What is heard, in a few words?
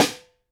Snare, Roland TD-20